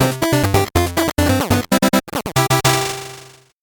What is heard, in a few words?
8bit jingle fast